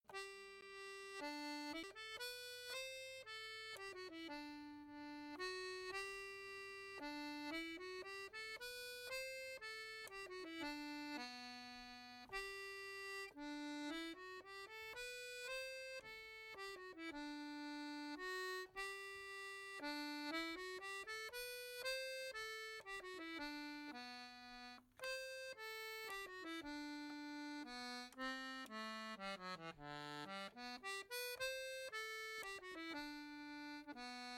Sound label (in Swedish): Andrastämma kadris vals